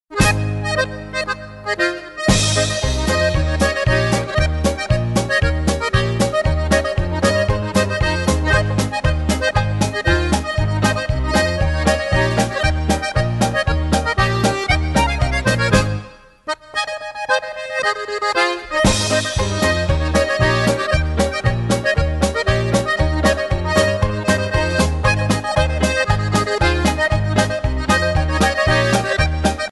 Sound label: Polka